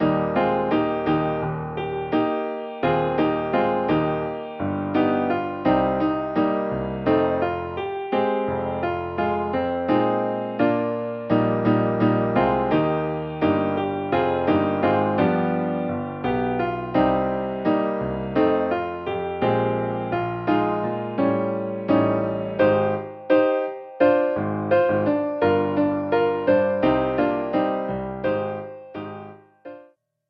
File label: accomp; hymnary